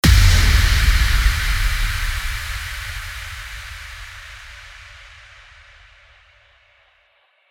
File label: IMPACT